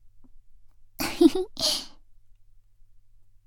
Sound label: laugh, ASMR; Garden; WithRin-Nazo01